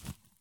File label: soil jump; Escape from Tarkov